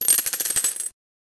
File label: coins